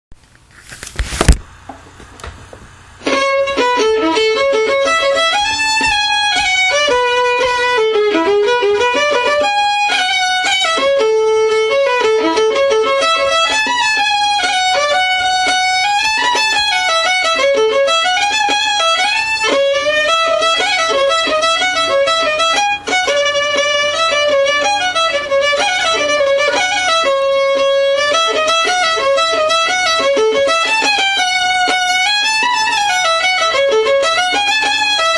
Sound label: Highland Jig, fiddle